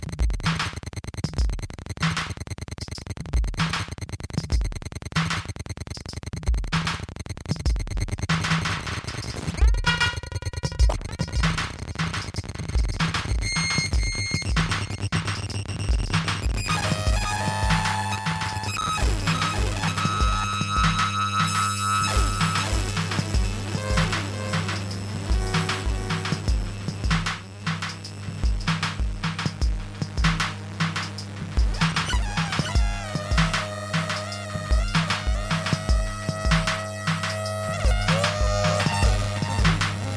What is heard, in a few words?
Noise